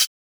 Closed Hats

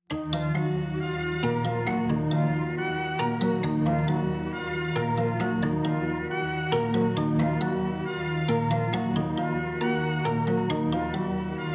136BPM, D# Minor; dubiose praktiken

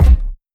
Kick; Traumah Backyard Kick

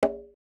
Percs

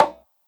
conga slap; Percs

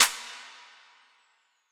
Clap

